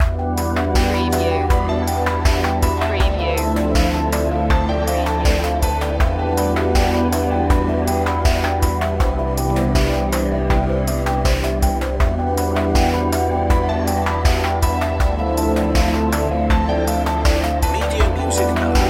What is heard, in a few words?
abyss loop